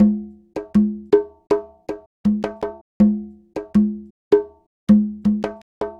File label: Conga, Latin Percussion